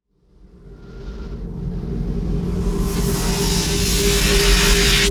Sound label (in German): STROM FX; Best Service; Advanced Mega Effects